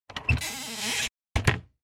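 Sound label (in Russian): открывание и закрывание деревянного со скрипом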